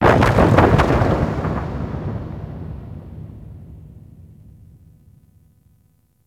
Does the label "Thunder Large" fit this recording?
Yes